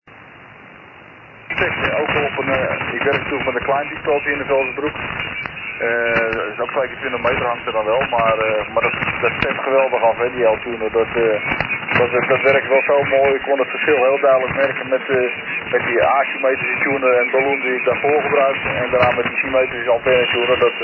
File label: static; 80 mtr; Jack op vakantie in Groenlo, deze static is anders dan de link hier boven.; PE2JV vak